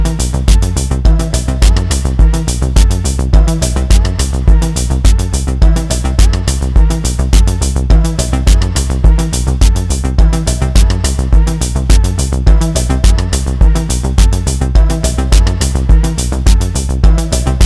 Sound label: Kord Drum